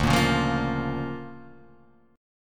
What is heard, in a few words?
D Minor Major 7th Sharp 5th, D